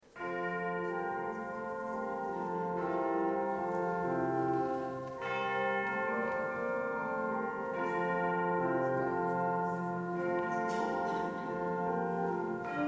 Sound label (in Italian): Dolci campane a natale